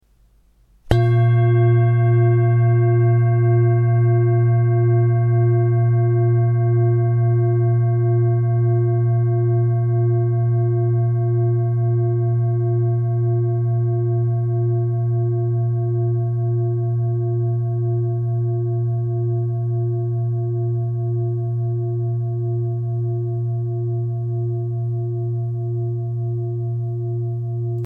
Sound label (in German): Klangschale; 1904g